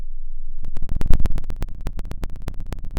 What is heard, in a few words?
pop, Sound of a fire crackling